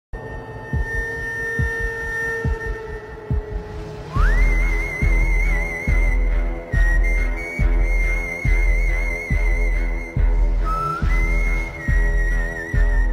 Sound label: whistle; Dabbang 3